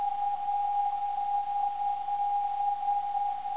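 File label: non-directional beacon; UVS; 309kHz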